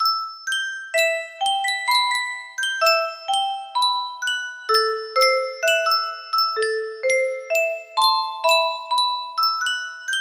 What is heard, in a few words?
music box; yunsheng custom tune